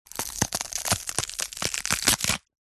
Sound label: horror bone break snap twist crunch; zapsplat